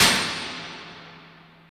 CYBER KIT, DRUMS

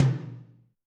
TOM Xfad L, Yamaha DTXpress-II

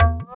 EQ Perc, Percussion Mix

